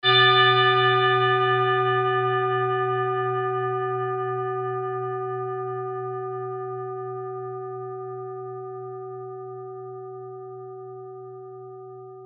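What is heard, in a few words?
tibetan bowl sunset, F sharp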